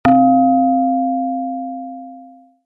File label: tone